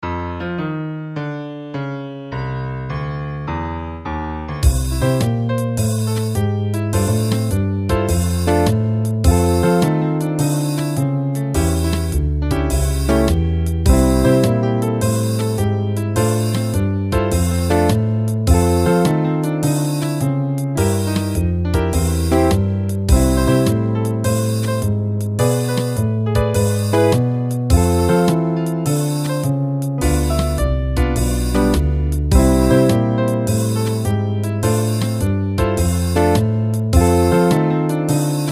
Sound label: Swinging Hangeul inst; 歌なし伴奏カラオケmidi